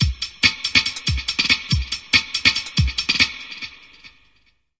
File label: 141 filtered funki roller; 141 to 160 bpm